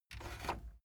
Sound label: cassette eject push